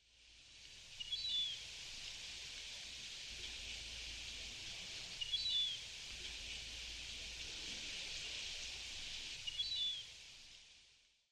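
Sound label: vega gull, sado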